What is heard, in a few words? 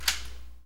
Door Unlock